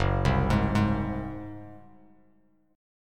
F sharp Suspended 2nd Flat 5th F sharp, Bass Standard; arpeggiated; JGuitar